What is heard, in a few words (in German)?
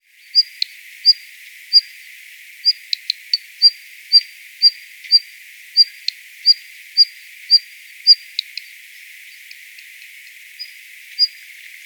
Hausrotschwanz Katzenalarm